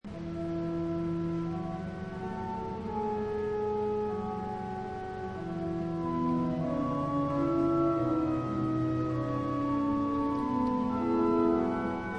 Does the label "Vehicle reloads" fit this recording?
No